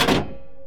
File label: Payphone Hangup